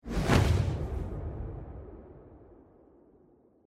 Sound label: magiceffect; The Witcher 3